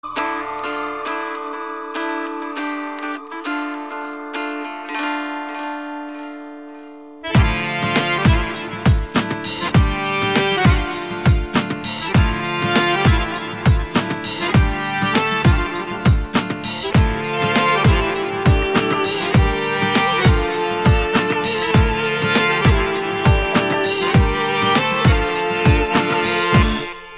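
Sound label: Armenian duduk beautiful calm music; armyanskiy duduk krasivaya spokoynaya muzyka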